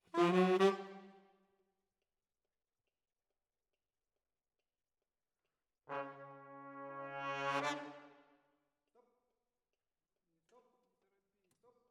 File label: Sax